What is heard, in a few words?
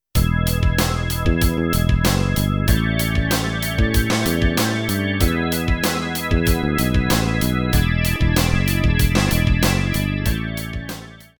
Sample YAMAHA